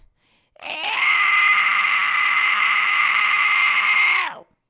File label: whistle fry scream; les effets complexes